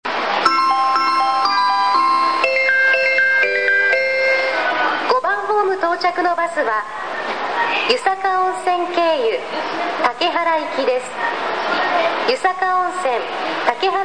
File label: bus center; ５番ホーム案内放送; 広島バスセンター; 広島そごう３階にあるバスターミナルで、高速バスだけではなく一般路線バスも発着します。; 出発ホームでは主に高速バス到着時に自動放送による案内放送が流れ、冒頭にはのりば毎に異なるメロディーがあります。